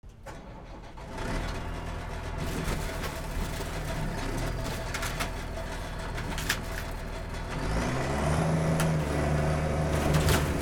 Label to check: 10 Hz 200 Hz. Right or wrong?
Wrong